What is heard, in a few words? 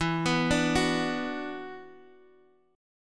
E 7th Suspended 2nd, Guitar, Standard; arpeggiated; chord; JGuitar